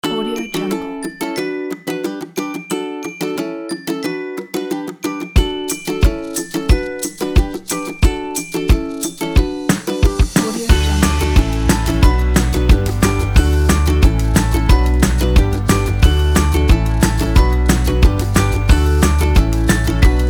upbeat happy fun

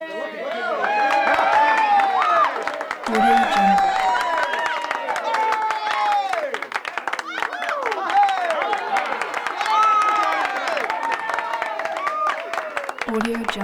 group cheer medium or large interior mixed

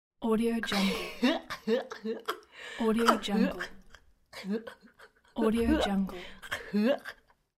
cartoon laughter

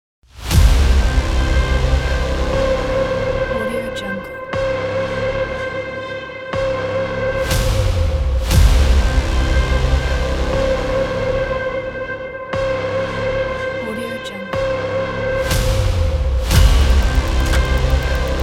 dark massive trailer intro; christianbaczyk

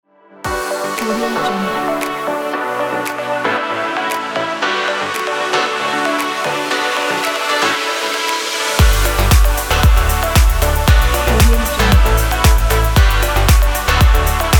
energetic upbeat pop dance

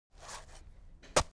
garden digging; foley; smartsoundfx

